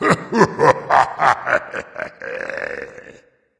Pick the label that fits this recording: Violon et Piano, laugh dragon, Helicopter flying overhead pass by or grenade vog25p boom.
laugh dragon